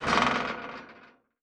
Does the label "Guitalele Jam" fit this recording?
No